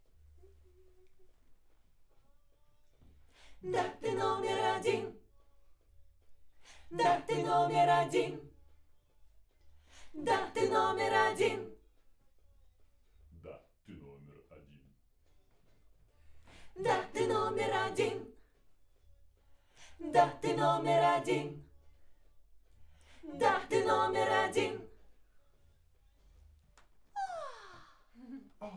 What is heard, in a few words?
Backs AKG LEFT